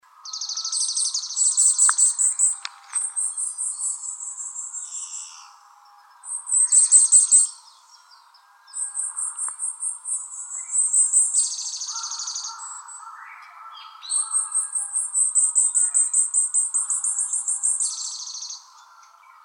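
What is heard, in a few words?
yamagara, varied tit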